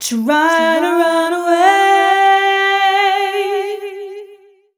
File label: f 132 mel, Vocals, fem